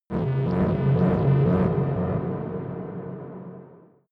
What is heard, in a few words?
space alien spacecraft noisy fly; raumschiff